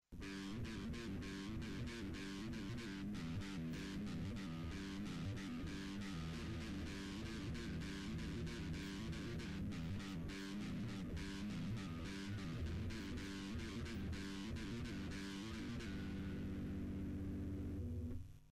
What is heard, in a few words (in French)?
Gamme, Guitare